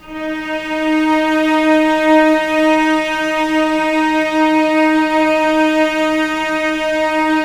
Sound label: D#3 LEG MF, VCS LEG MF E, The Ultimate String Collection